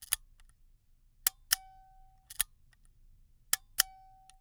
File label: Camera, Argus, aluminum, steel, professional, fully mechanical, advance film, snapshot, double; Eiravaein Works; Vaeyan IV